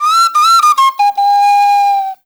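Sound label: PENNY WHISTLE; Spectrasonics; Heart of Africa 2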